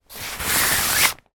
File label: jacket raincoat arm into sleeve; zapsplat